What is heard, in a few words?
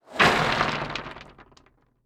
Crashes Rock Debris On Wood